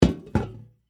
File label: Flower Pot Down, Set, On, Wood, Metal, Container, Full; Household; Set Down Sound Effects